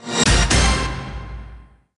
Mission Clear

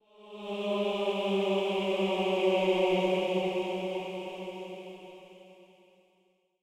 amb hymns eerie; gamerip; blackfaun

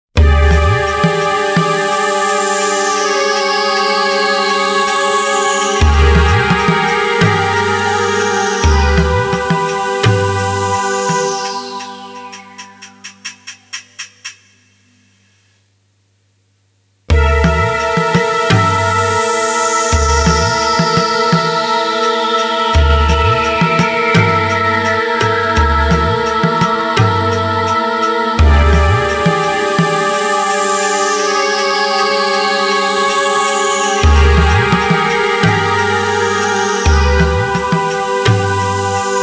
Ominous; Shenmue III

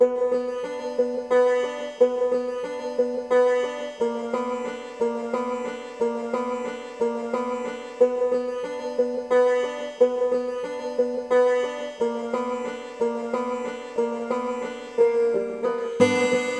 Banjo Intro; Bighorn; Darkest of Days; xbox 360

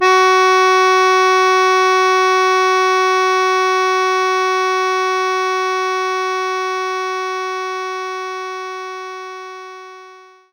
Accordian D3

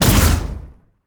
Sci Fi Explosion

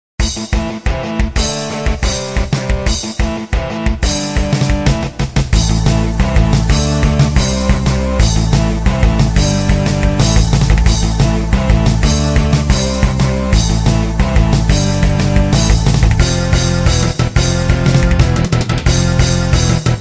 bgm coin; escape game himememe naranohito; android game music

